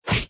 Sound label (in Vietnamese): Am thanh danh len